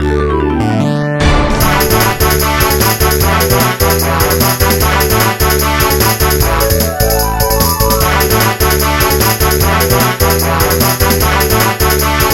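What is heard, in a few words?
Battle Victory, MIDI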